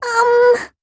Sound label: ummm